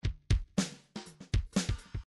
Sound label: bdf midi file grooves funk misc funk misc